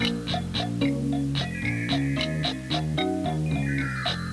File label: song; lavalovelounge